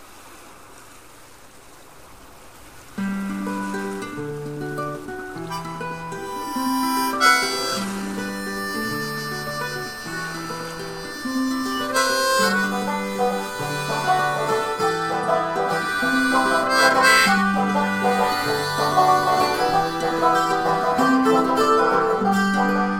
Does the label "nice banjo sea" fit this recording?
Yes